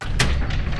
GATE START

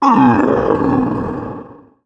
HURT

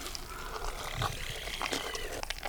EATING; mahlendur

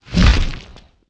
WING FLAP

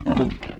ATTACK; mahlendur

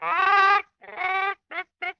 SHAKE HEAD

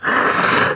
HURT

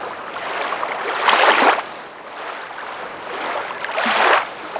river trouble

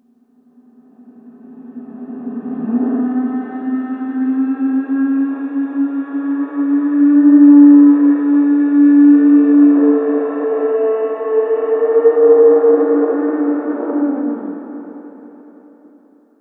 ambient scream

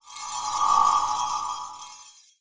LIGHT CAST

